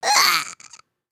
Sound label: Die; Lego; Strombie; creatures organic